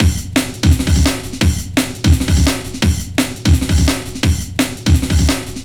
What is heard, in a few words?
Hammer Break, Drum & Bass, Beats & Breaks; Fluid Dynamics